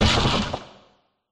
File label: cry